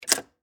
Electric Typewriter, Type, Single, Key, Swintec, 8014-S; Technology; Typing